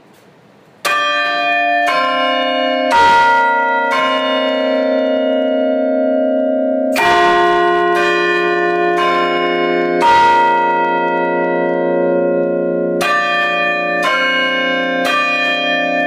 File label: Madonna Quarter